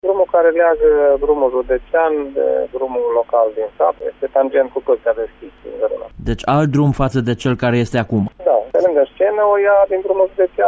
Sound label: drum; garana